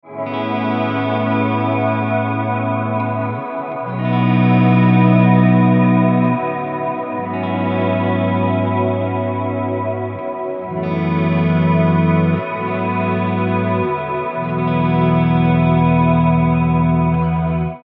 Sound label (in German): swell aufgedreht